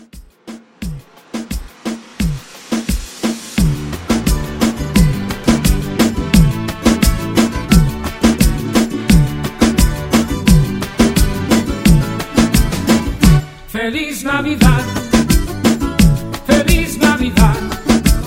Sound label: Christmas Reggaeton Re Drum 87 CLEAN